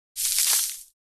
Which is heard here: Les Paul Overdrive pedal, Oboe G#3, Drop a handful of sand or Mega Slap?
Drop a handful of sand